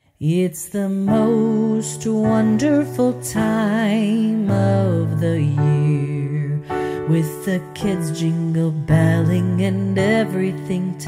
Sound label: cadences